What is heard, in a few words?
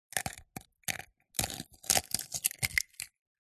egg crack into glass jar; food; zapsplat